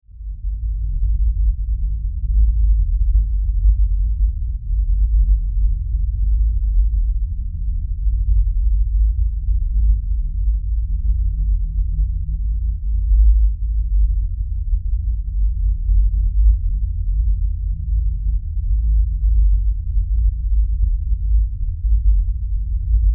Science Fiction Drone Deep Rumble; Sci-Fi; SFX Bible

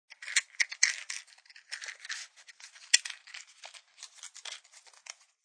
Matchbook, Matchbook sounds.; Flames; Objects

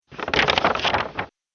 War Map Paper Movement; Paper Sound Effects

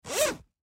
Gym Bag Zipper, Gym Bag Zipper Unzip; Equipment; Sports

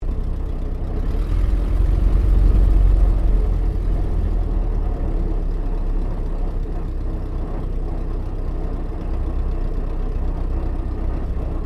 AIRCRAFT DH82A DEHAVILAND TIGERMOTH TAXI INTERIOR; RAAF Tigermoth; Airplanes; Vehicles

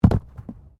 Body movement Fall Heavy; Body movement foley to simulate falls and deaths; Wrestling and Struggling

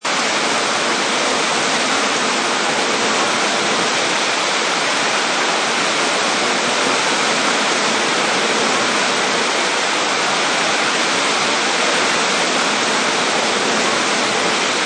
Wind Loop; Weather

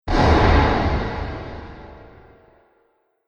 Fantasy Explosive Impact

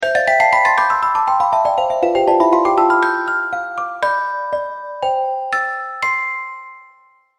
A Celestial Tune; Musical Sound Effects

